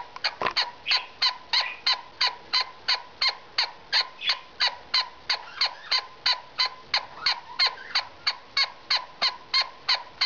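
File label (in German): atemgeraeusch syrinx, wellensittich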